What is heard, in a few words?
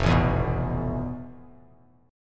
F Major Sharp 11th, Bass Standard; strummed; chord; FM#11; 1,0,2,4; JGuitar